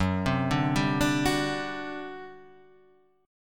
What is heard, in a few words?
G Major 7th Suspended 4th F sharp